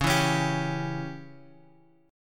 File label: Db Diminished Db down Guitar Open G